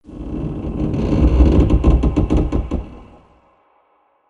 bridge creak; ambience gnarled forest

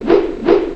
Throw Loop; creatures organic; aronwk collection; ndaudio

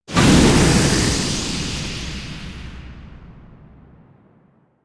Geiser Burst Big; ambience

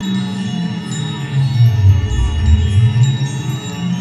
carrot confuse; weapons

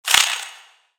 Spider Alert; creatures mechanical; Lego

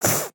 Kitty Cat Long Lick; creatures organic; Backpack

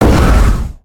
Dragon fireball, creatures organic

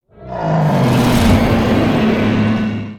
Pet Dragon Howl; creatures pets

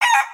cluck; creatures organic

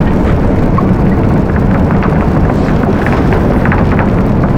Aura Mael Drill; ambience

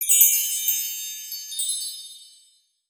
bell tree; ambience

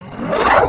vo maelstrom shot; creatures organic

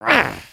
Get Hit Oneshot; creatures organic

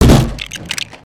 cans plastic; destructibles

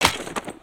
Skullken Walk Run; creatures organic

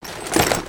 equip rattle; creatures organic

